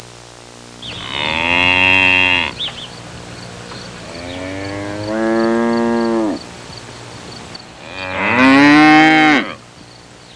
Farm cow mooing bird ambience

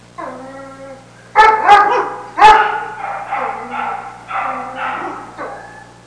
Kennel barking

